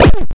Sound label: Hurt